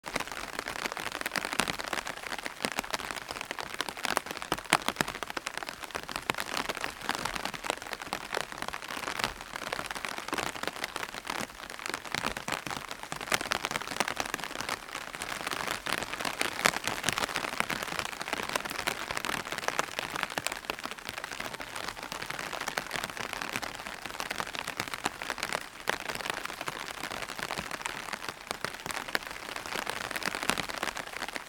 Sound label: Light rain on umbrella underneath perspective loop